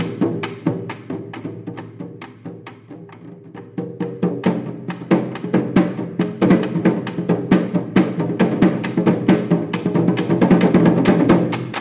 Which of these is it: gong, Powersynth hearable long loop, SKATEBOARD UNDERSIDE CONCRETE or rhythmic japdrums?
rhythmic japdrums